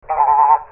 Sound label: goose